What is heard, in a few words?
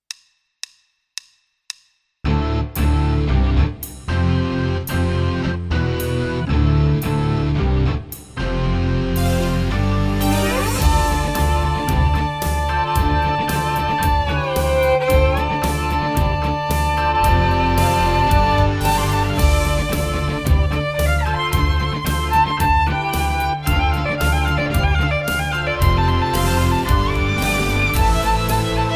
Power Chords